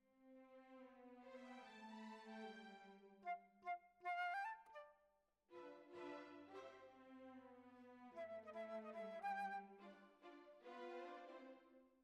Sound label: Flute